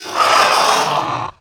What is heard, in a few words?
Raptor Play